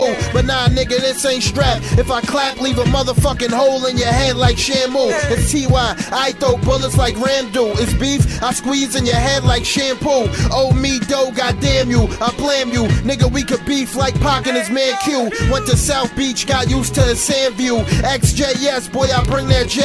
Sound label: Eww